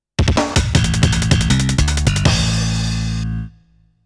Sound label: Game Over